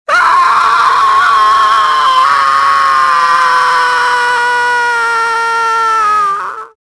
falling; luke

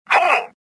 pain

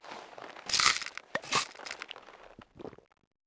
Use Painkillers; characters

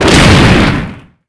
impact wall; Quantum Redshift

